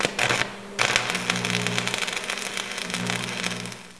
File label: FUSEBOX; Comedians - The People Behind the Laughter